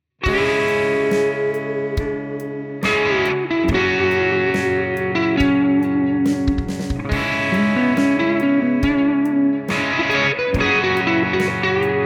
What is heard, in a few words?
Blackface